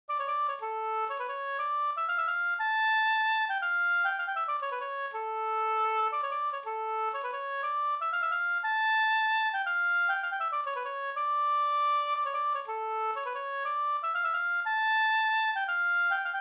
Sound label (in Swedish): polska Bodapolska